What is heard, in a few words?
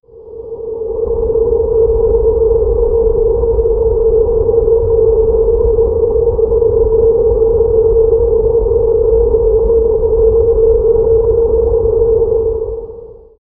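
sound for an ocean environment, submarine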